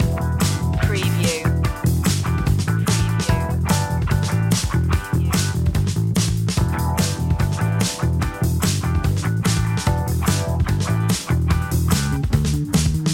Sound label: cool attitude upbeat loop